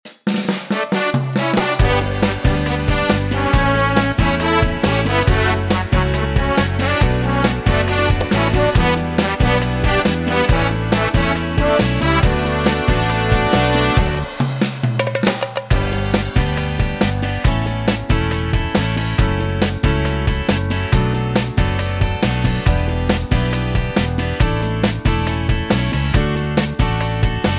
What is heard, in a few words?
Style Yamaha; yamaha genos 2